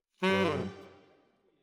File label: Tenor, RNR for piano